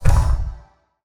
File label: Shield Block; portal quest; android; Shield Minion